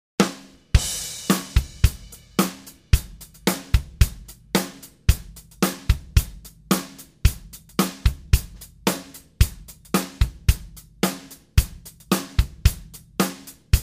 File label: Drum Sounds